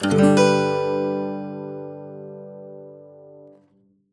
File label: Chord; Google; Google Sounds